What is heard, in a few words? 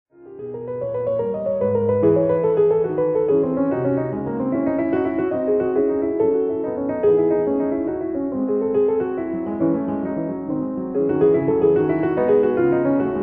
Clavier, C sharp minor Fugue; The Well-Tempered Clavier